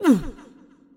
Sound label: Damage; Adora